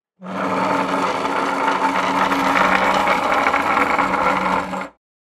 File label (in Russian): zvuk taburetki